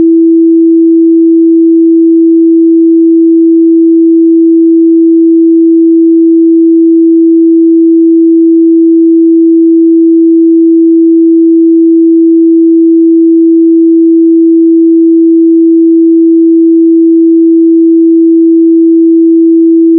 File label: 1 string, guitar